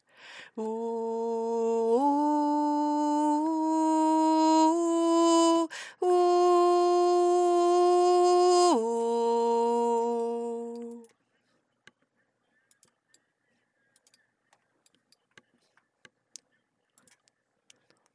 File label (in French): basse